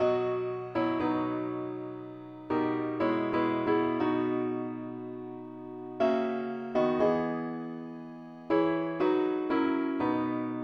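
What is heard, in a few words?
parts only keyboard; pilot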